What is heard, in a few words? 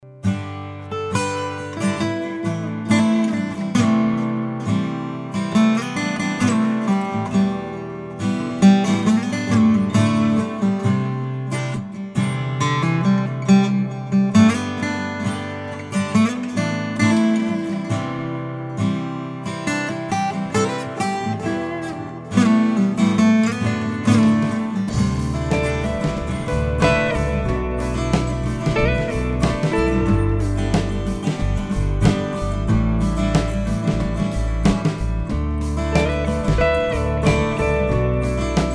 Songwriter Guitars